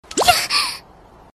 kyaaa; miku nakano

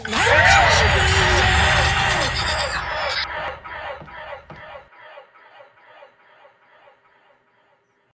buttons played at once

